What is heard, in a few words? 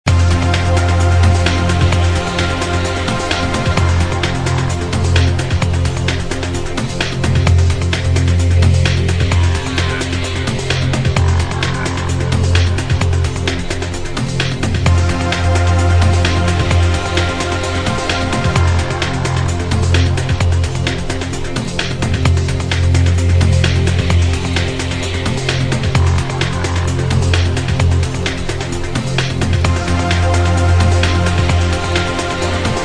music battle; Ioncross Total War